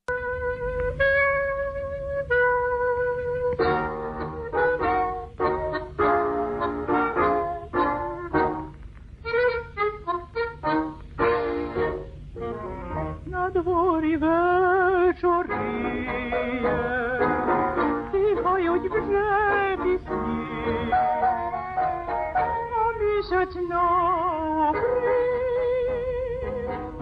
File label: Ukrainian tango